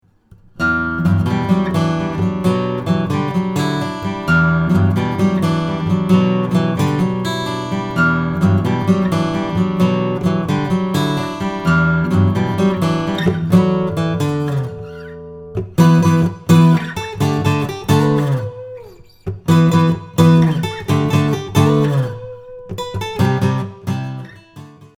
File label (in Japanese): akogi